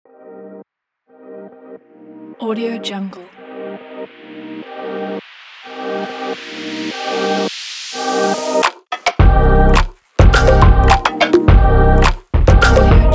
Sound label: abstract fashion pop; qubesounds